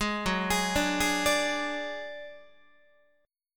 Gb Suspended 2nd Sharp 5th Guitar 4A,4E,5A,5D,5G sharp,6C sharp; Gbsus2#5 arpeggiated; 11,14,11,12,12,13